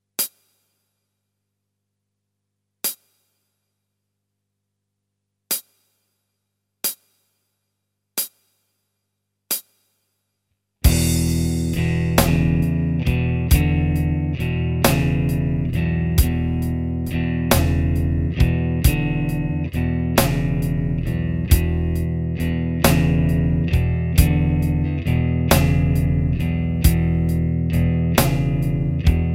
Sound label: Blues Basics Rhythm Groove